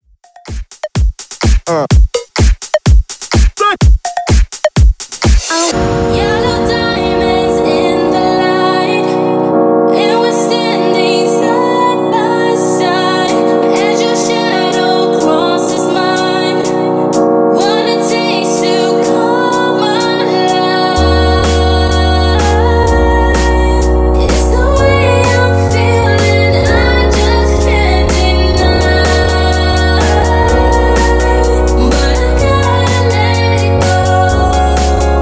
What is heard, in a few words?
Electro 126Bpm; Mixshow Edit